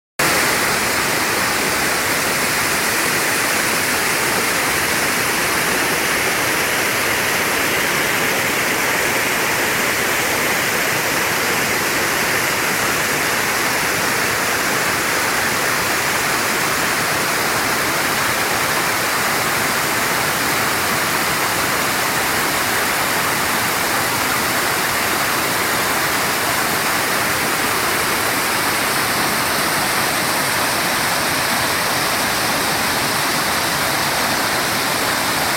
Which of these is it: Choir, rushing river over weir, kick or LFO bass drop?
rushing river over weir